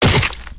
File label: stone arrow